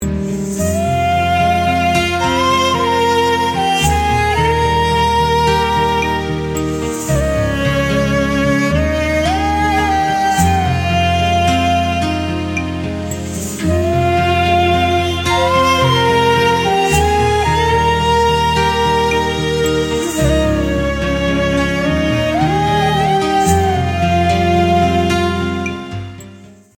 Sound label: Ringtone; Hum Tere Hain Sai